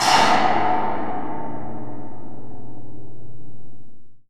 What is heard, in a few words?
SCRATCH WEL, PERC METALIC; Rarefaction; Poke in The Ear With A Sharp Stick